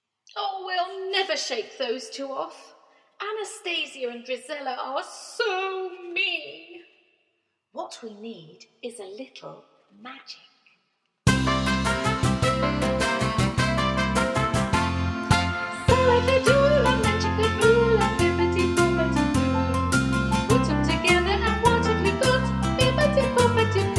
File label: Vocals